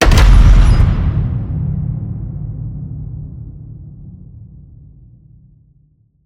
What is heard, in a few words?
HIT Metal Crash, Cinematic Hits Designed